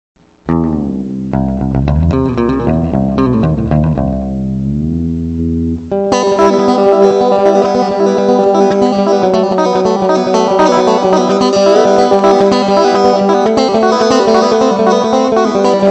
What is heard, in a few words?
chicken picked blues, guitars